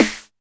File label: Snare, Alesis DM5 Drum Machines